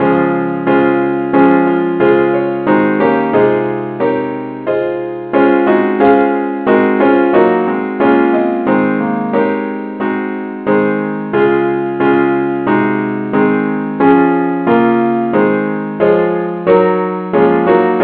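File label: key d flat; saintthomas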